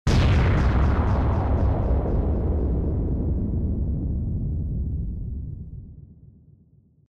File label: explosion; 爆発2